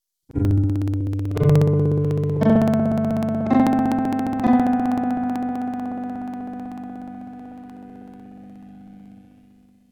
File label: startup sound; stereotype